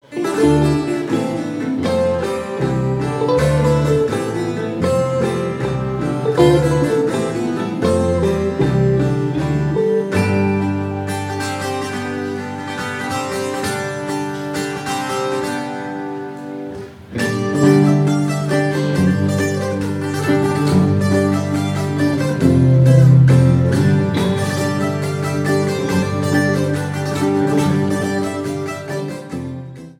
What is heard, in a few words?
guitar